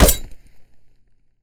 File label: Machete Hit; zps; Weapons; Melee